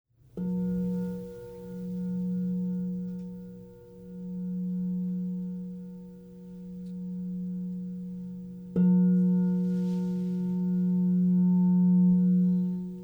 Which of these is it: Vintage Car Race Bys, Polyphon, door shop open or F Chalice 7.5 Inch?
F Chalice 7.5 Inch